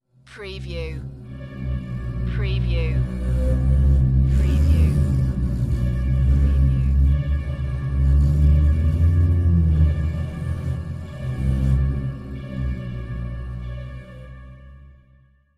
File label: Trailer Production Elements - Uneasy, Alert, Undertow